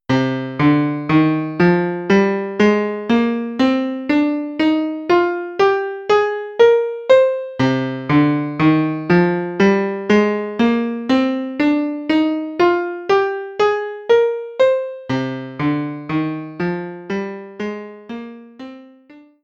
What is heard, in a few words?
scale; Sonic Pi